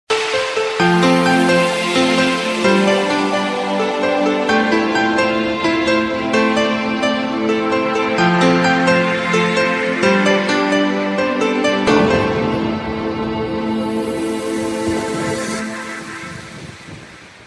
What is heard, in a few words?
Piano Logo